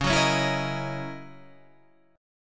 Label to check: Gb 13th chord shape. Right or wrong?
Right